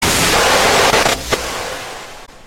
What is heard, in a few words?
rush jump; slender